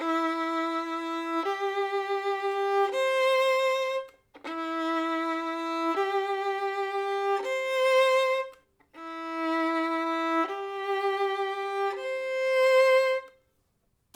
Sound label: violin